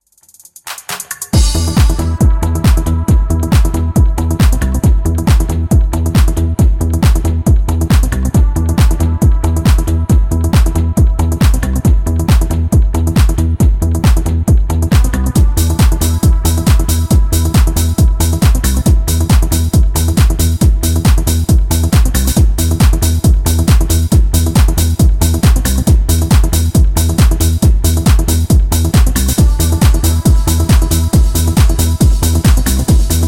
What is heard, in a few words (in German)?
Glockenspiel